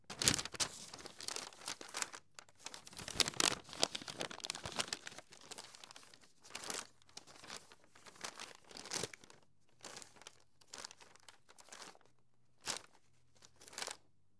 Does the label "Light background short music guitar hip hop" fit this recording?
No